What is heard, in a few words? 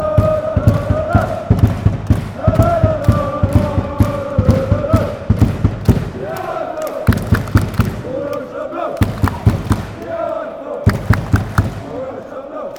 chant, norway; aalesunds